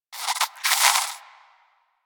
Rodent Vocal Snore; Warframe; Hunting Critters

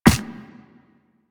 Run Ice, Footsteps; Warframe; Operator

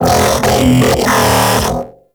Mech Ambient Vocal

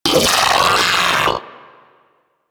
Arachnoid Vocal Player Spotted; Enemies; Vocals; Warframe; Corpus; Arachnoid Hunger

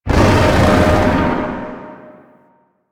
Void Dragon Vocal Pain; Enemies; Vocals

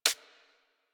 Bird Of Prey Feed Ground; Characters; Warframe

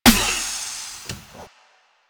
Car Tire Pop; Nineteen Ninety Nine

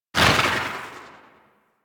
Swarm Rifle Tail; Warframe; Infested; Shock Swarm Rifle

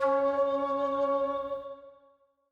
C Sharp Lower, Player Instrument; Yoke Idol

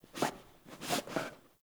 Battle Dog Sniff; Animals; Vocals

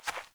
Beaver Footstep, Foley; Animals; Ambient

